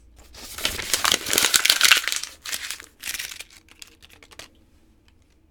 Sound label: music stationary